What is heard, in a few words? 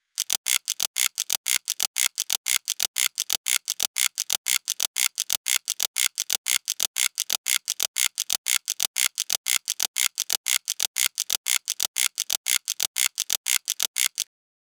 a robotic servo mechanism